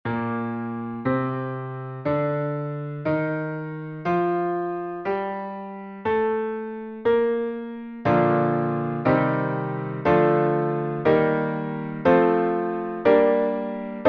Chord Shapes Basic Scales And Triads Bb

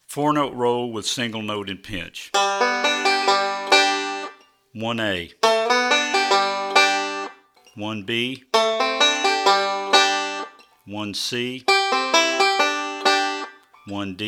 Four-Note Roll With Single Note

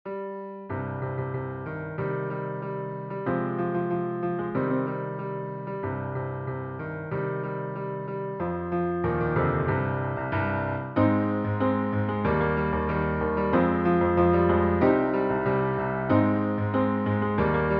Sea Shanty Piano

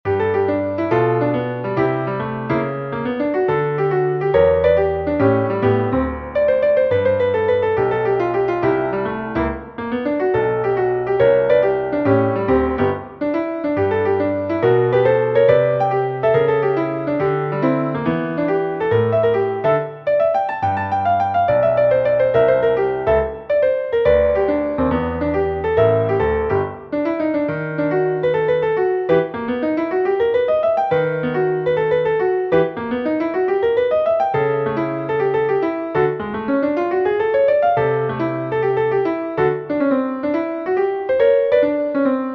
Jazz Exercise

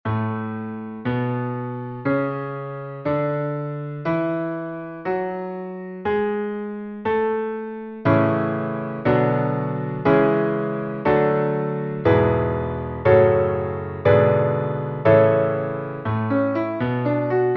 Chord Shapes Basic Scales And Triads A; Chords Packet 1

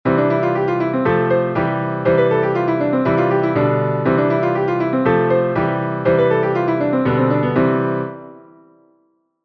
Piano Agile Hands

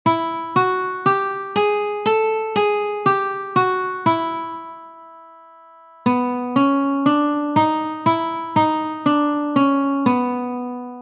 Guitar Finger Exercises; Sound Prisms Guitar

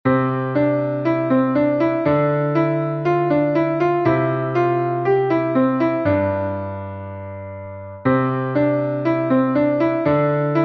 3 Note Scale Fragments, Piano Warm Ups

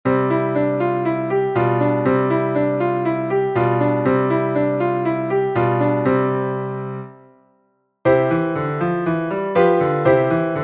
Czerny Style Eighth Notes, Piano Warm Ups

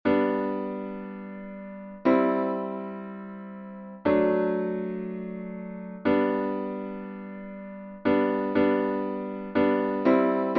Chord Structures And Rhythms G; Chords Packet 3

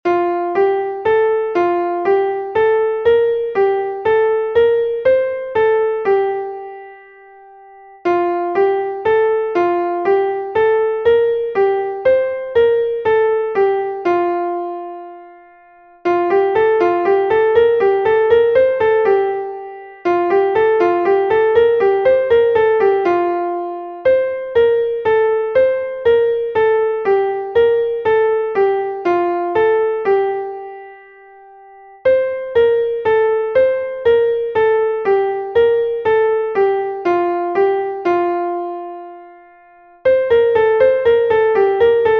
3 Note Scale Fragments F, Piano Warm Ups

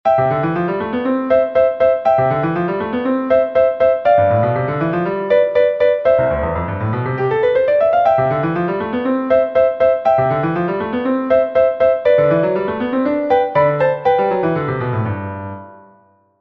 LH Romp - C; Czerny

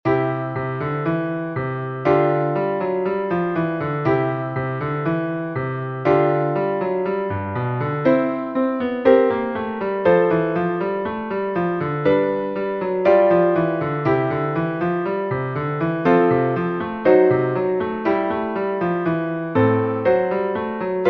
Jazz For Young Pianist Exercise

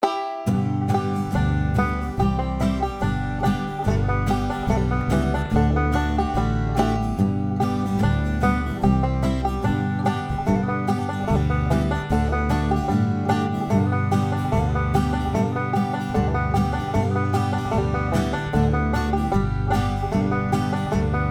5 String Banjo Natural Style

